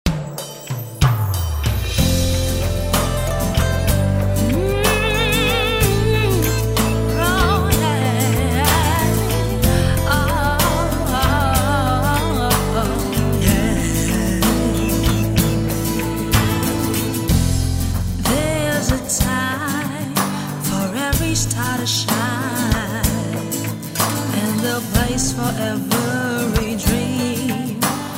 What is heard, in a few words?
Acoustic